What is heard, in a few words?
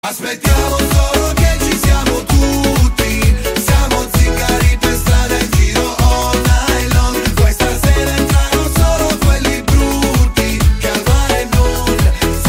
Salsa